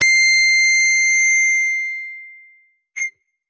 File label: guitar electronic, distortion guitar